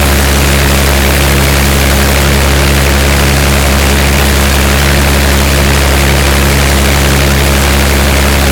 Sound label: Aircrafts Engines DB605; DCS